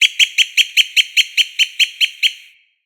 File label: Bird Alerted Blackbird Call; Midrath Island; Ambience